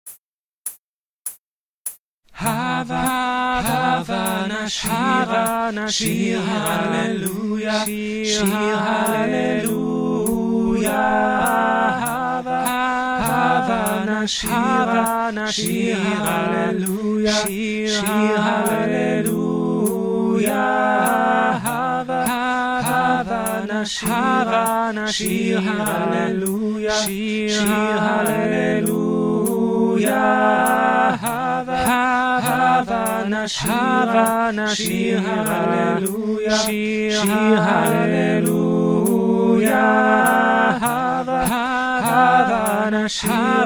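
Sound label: havanashira all together, Choir Music; BCC choir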